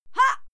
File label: female fight scream short